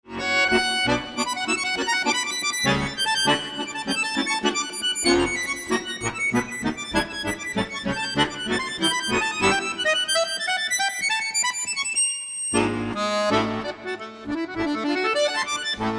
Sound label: Tarantella, akordeon